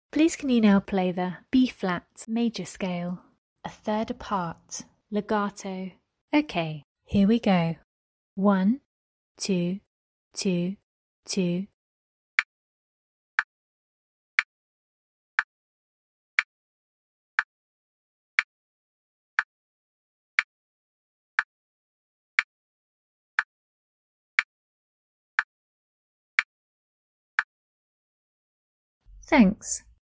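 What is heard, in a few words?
B flat major scale 3rd apart legato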